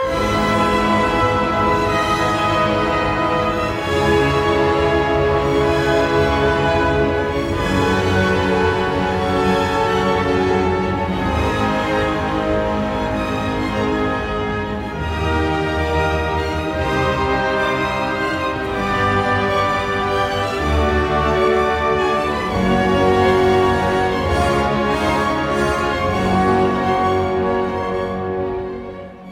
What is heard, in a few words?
Bruckner 9th 4th Movement Chorale Beginning; Rattle